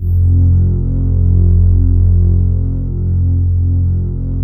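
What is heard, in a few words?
touch strings F2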